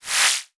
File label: Multimedia Internet CD-Rom Flash Wipe Short